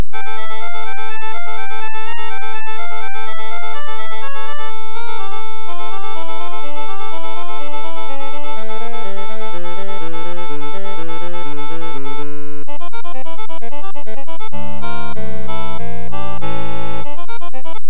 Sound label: toccata sound example; koelker